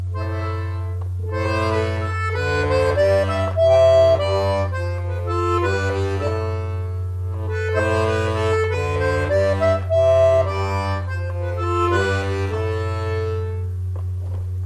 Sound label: melodie